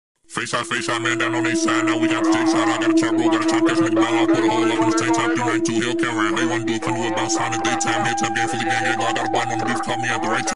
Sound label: facetime facetime